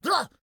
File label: Pirate Barrel; gamerip